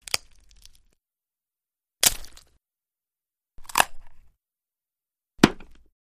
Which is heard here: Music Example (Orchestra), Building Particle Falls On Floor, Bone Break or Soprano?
Bone Break